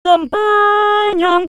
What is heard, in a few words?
contralto; VariAudio